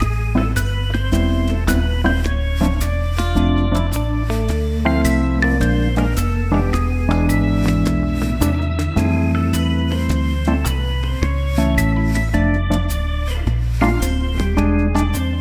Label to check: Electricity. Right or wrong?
Wrong